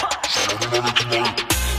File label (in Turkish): takipci sesi